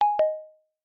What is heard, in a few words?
Go Down, phonetones notifications; Infinix NOTE 30